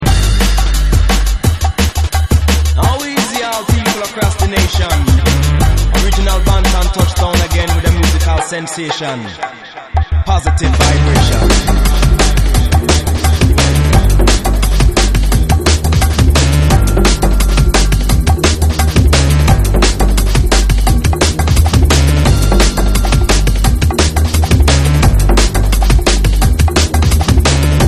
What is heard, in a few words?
electronic drumnbass; sampler electronic; brasil